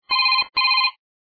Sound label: Classic, ringtones; Okapia; Signature L